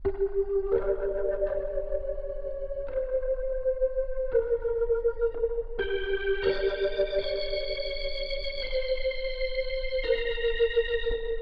Organ

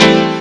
PIANO; SoundFactory

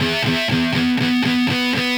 Electric Guitar, Loops; Guitars

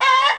CHICKEN; SoundFactory; SMPMANIA; EFFECTS

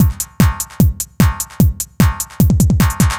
Dance Drumloop; Drums; Dance Beats

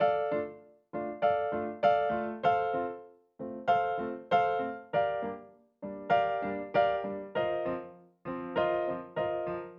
Acoustic Piano

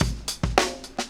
Old School Break, Drums

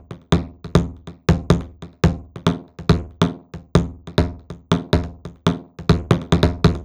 Electronic Percussion

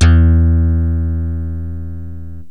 fm bass, yamaha rm1x synth

